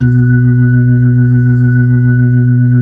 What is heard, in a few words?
B-3, Blues; Keyboards of The 60's and 70's